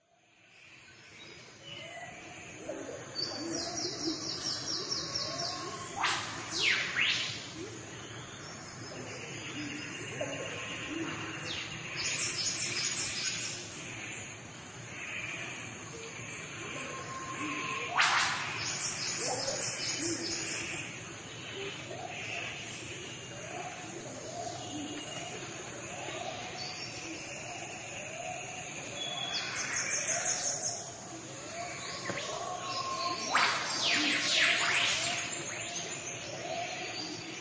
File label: Australian rainforests nature sounds of nature